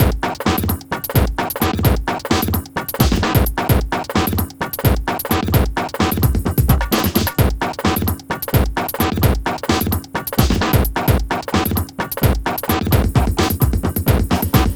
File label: Processed Beat, Drums & Percussion